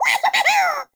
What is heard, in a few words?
Trapped Sprout Falling Vocal; Characters; Friendly; Sproutfolk; Fey Hub Sprout